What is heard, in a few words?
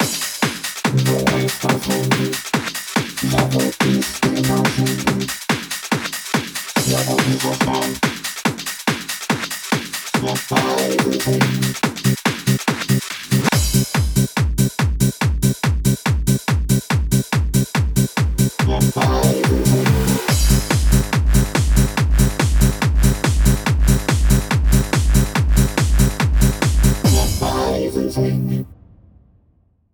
hardstyle